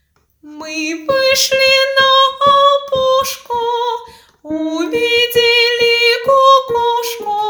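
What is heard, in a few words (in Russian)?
Kukushka melodiya golos, cuckoo melody voice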